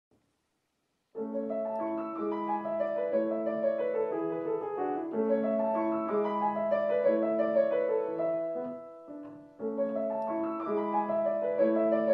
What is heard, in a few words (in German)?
Allemande Deutsche Tanz A Dur fur Klavier piano